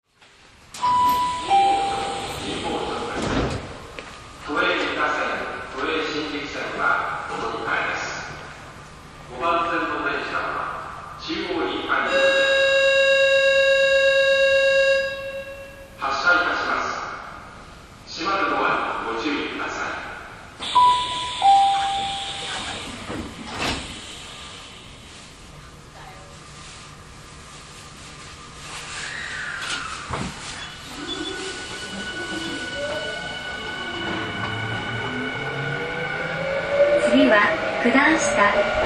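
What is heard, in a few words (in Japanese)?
走行音, 5000系; Tokyu; 神保町→九段下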